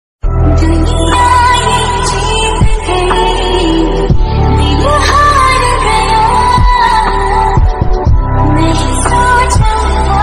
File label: sad song ringtone; broken